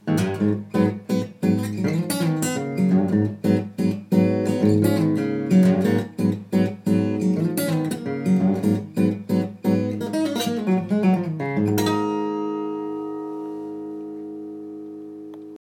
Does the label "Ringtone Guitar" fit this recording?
Yes